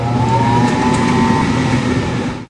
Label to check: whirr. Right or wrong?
Right